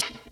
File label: hat; hl2mp; mpc